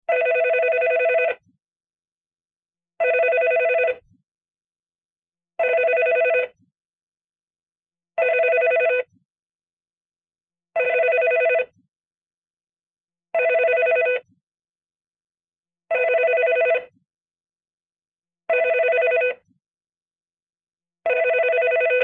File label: Phone ringing classic